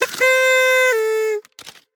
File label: win trumpet; Hot Party Deluxe; Wazdan